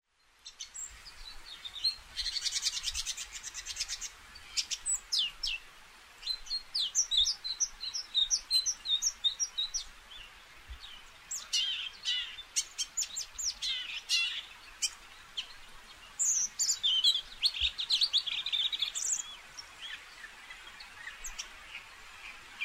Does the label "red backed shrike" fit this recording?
Yes